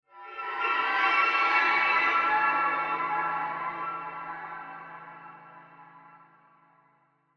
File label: Horror Swell Metallic Swirl Eerie Thick; Scary Tones; Blastwave